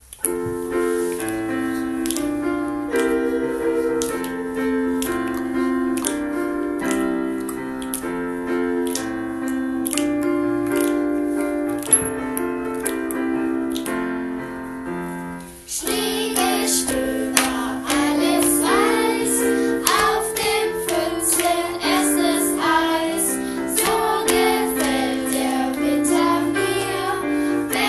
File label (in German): Schneegestoeber Gesang; musikalische grundschule